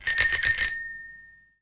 hasty bell

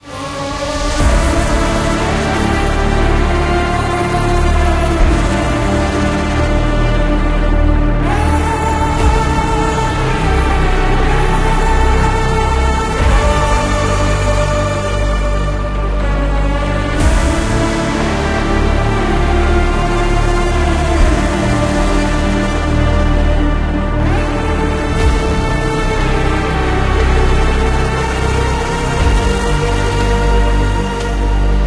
opening loop

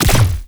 energy gun

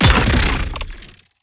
gavel

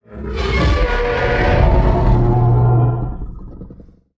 huge monster sad

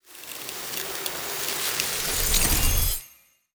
sand sword

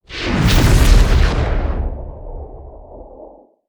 e skill b snake 1 to 2 evolution

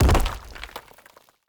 ground hit land

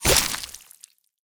blood splash

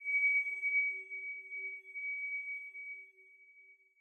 tinnitus

